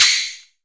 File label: SCHAKEEN, PERC; NorthStar - The Wizard VOL-2; TSAR PERC